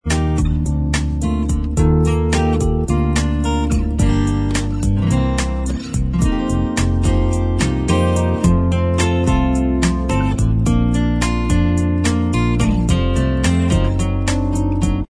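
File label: bossa version instrumental track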